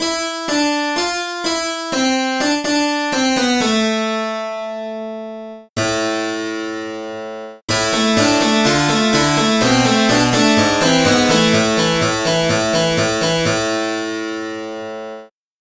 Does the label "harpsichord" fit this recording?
Yes